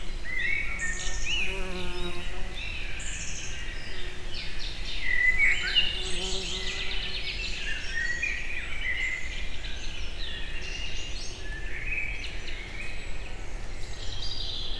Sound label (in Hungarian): erdőből a távolban ugató kutya; Egerturistaház; termeszetben